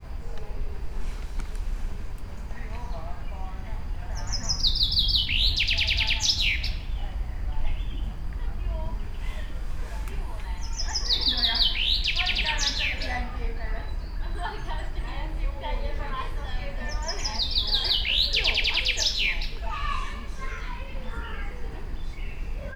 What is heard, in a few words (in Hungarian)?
szabadon levő, erdei pinty; allathangok; veszpremizoo 2013; akg